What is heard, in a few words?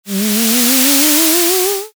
door creak dark